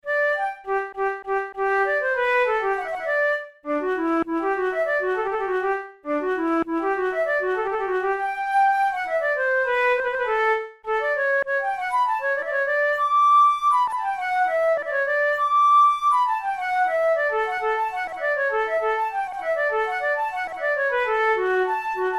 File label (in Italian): capricci per flauto traverso; ruge